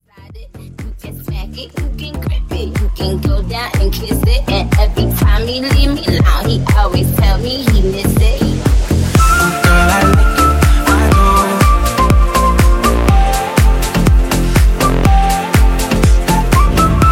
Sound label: Future House Mashups